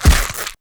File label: wolf hit; were